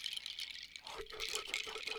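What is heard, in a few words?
Music Village Calm Near; from dust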